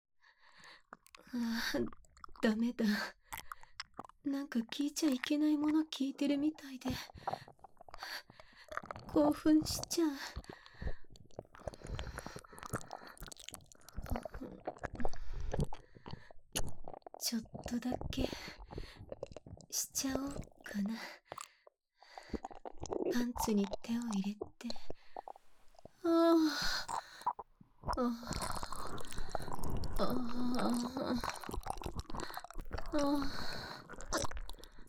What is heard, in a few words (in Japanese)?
耳舐めされながら ベロチューされ、手コキ・パイずり・フェラされる音声; オナニーするドスケベ隣人妻